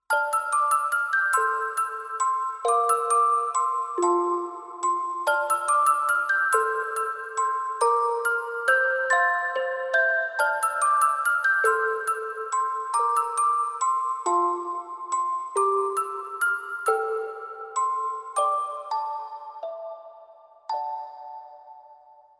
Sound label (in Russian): korotenkaya krasivaya melodiya shkatulki